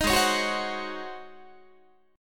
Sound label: G sharp 11th G sharp, down, Guitar; G#11; strummed